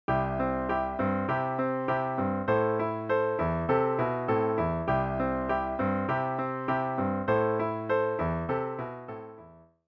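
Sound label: vamp, piano rendition of the chords, C Am; song intro; 3-Chord Vamps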